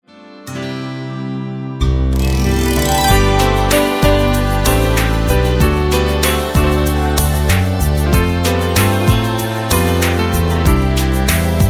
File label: Dinos Lullaby